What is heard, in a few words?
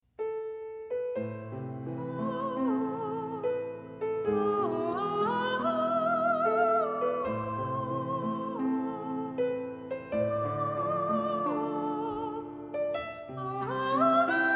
piece for piano and vocalise